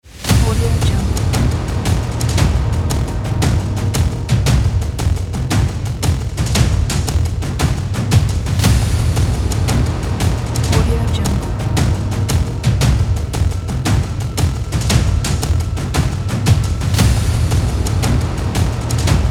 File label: action trailer intro